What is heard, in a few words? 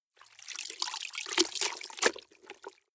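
clothes water up down medium, household; haus-garten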